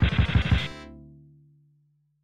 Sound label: Coin; Cameltry; Taito B System arcade